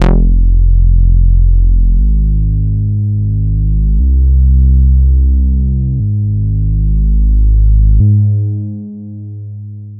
Behringer Toro ENV Trigger 8tel

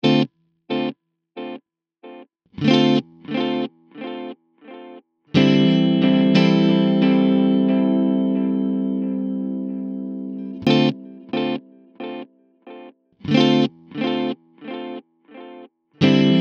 Coole Rhythmen mit Delay Analog Delay 90 BPM ¼

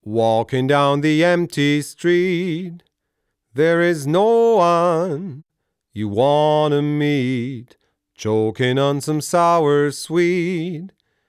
Elysia skulpter 500 Vocal Shape 30 Kompressor medium

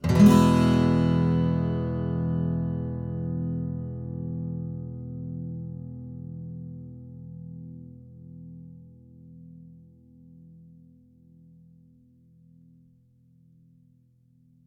Gitarre RODE NT1000

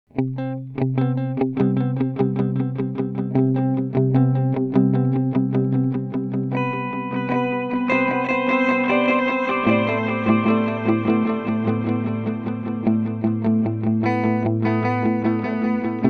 Free the Tone Future Factory Seriell Preamp

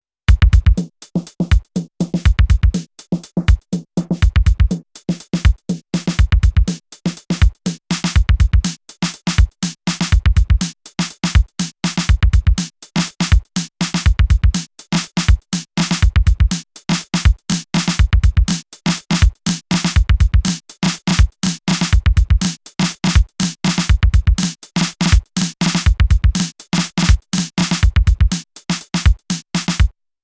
7X7 Snappy Flam bei 707 Snare

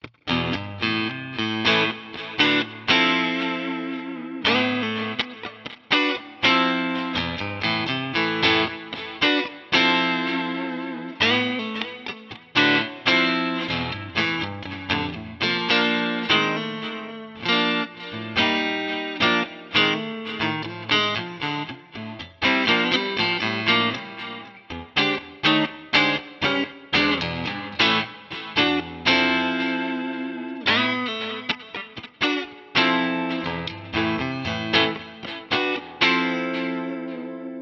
DI Aufnahme mit Boss CS 3 vor Plug in

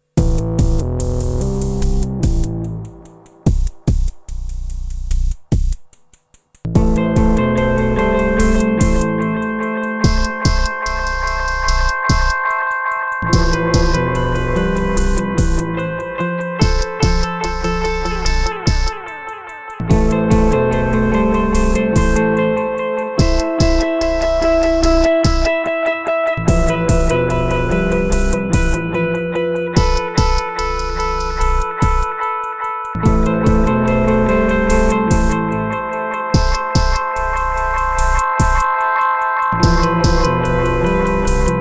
SOMA Pulsar-23 mit Gitarre BPF Delay

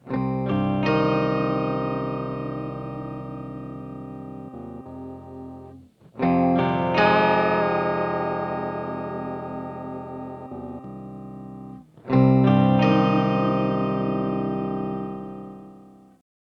Harmony X2 Oktave Quinte Oktave; Mooer

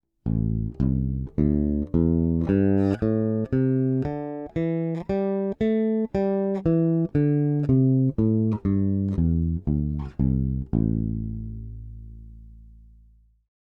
Big Blade Bridge PU aktiv; Nordstrand

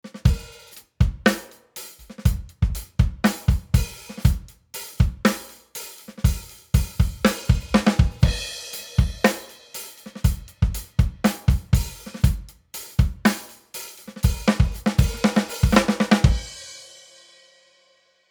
SPL Iron v2 Drums ohne Kompressor

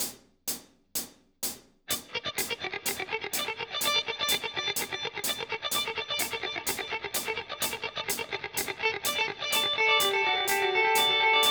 Coole Rhythmen mit Delay 126 BPM Dotted Eight

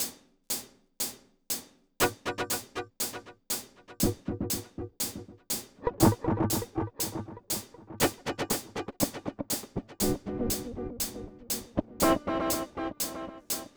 Rhythmen mit Delay 120 BPM Guitar Rig 7 Bouncy

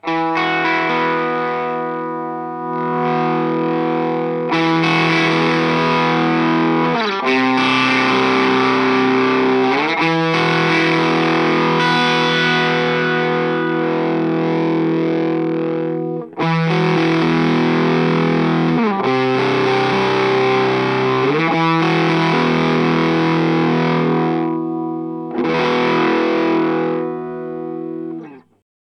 Octave Clang mid Gain Gitarren Volumepoti; Death by Audio

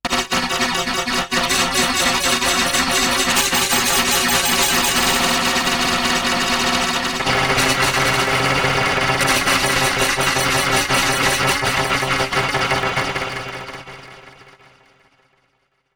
Granular synthese Four Voices; Emu EIV classic und Ultra

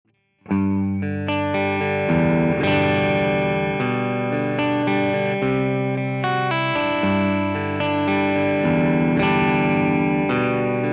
Schecter SLS Elite PT Beide Voice 2 Neck Voice 1 Bridge

